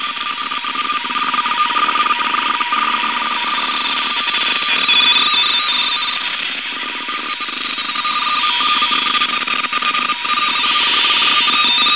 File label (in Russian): kripto